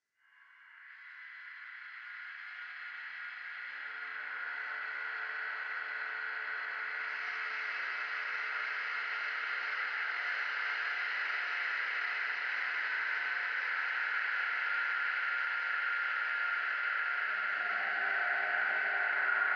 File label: Dry Leaves and Broken Glass